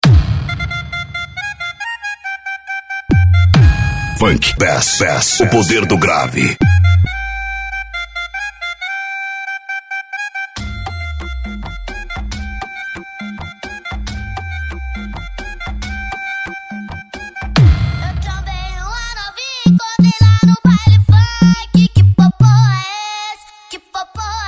funk bass o poder do grave

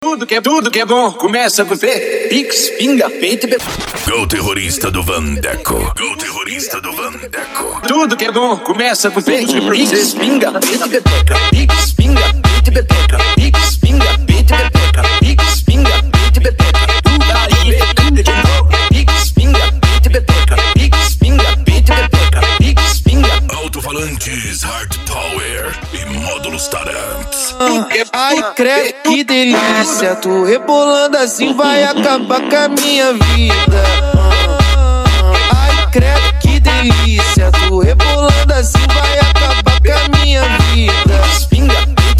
dance e funk mega bass

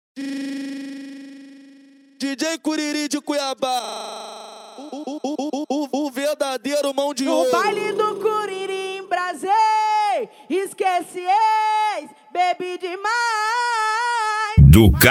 funk agressivo do sul

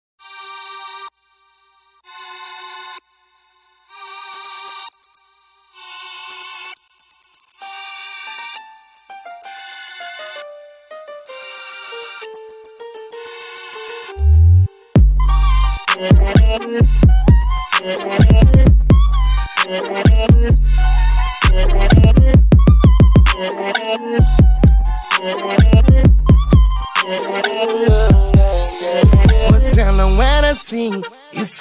explode funk

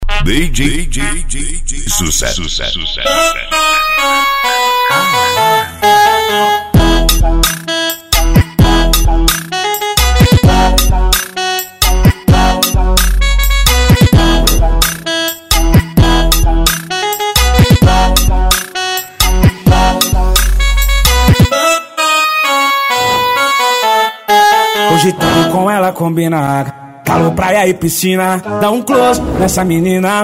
especial funk

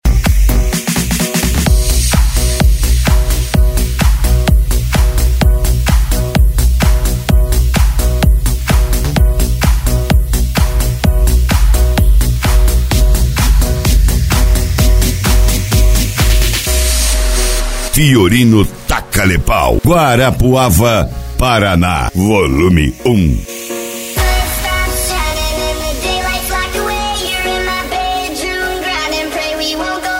especial funk e electro house

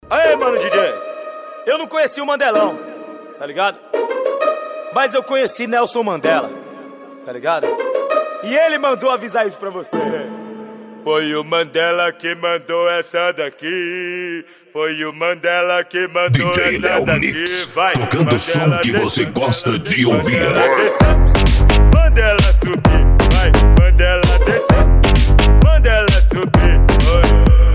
pancada e eletro funk

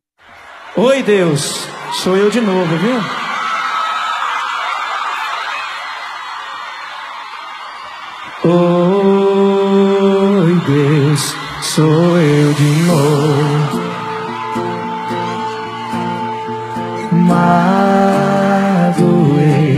sertanejo

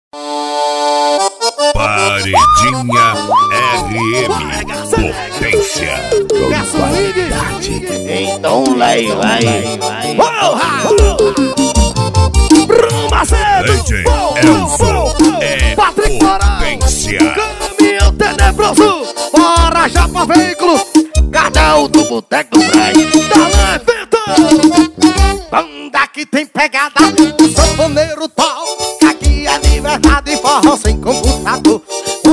forró sampleado; cd paredinha rm vol 02

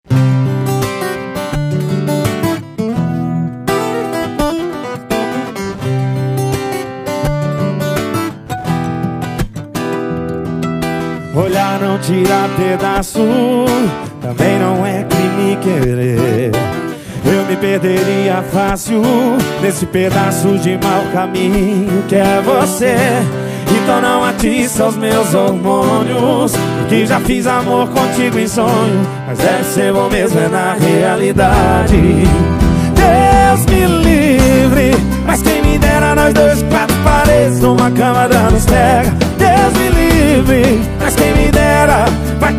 sertanejo universitario

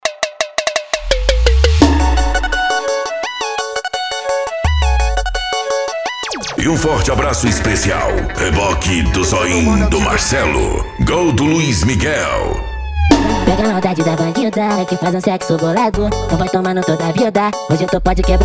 som automotivo

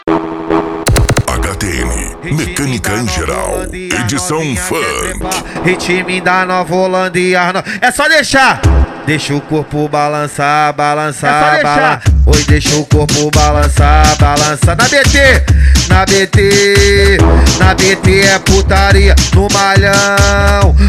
mecanica em geral edicao funk

